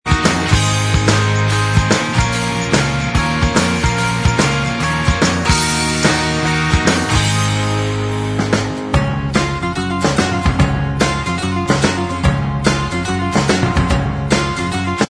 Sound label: Inst